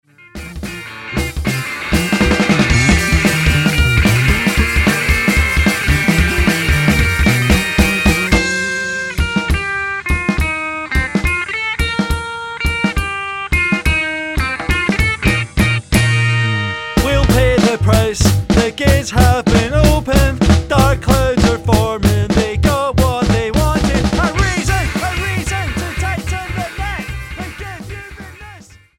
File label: quirk pop